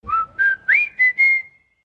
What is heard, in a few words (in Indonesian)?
siul; tokopedia